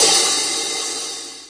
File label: HH o Brush kit, Electronic Jazz Kit; STYLE KITS (Hip Hop, Etc)